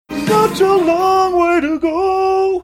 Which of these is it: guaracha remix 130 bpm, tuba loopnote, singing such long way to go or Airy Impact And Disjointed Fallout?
singing such long way to go